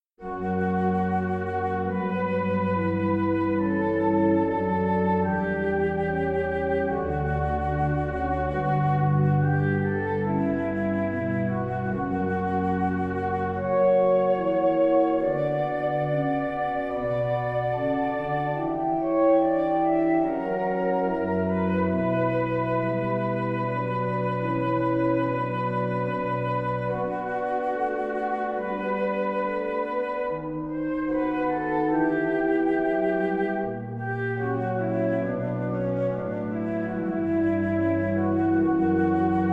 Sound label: trio